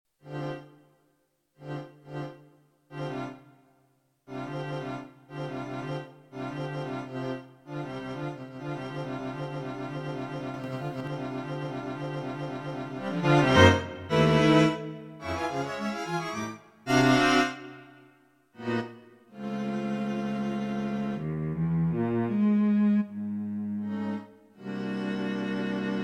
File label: String Quartet